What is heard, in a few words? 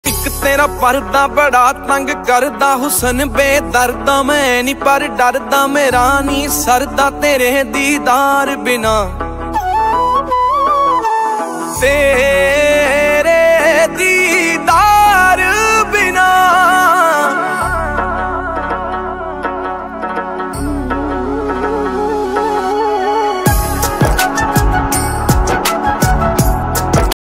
punjabi ringtone